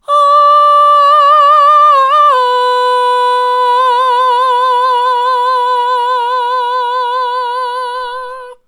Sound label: ahh hard, vocal